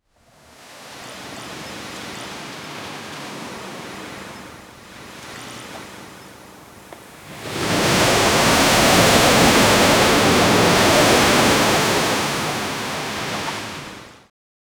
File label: waves crashing violently; maritime